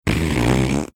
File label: making farting sound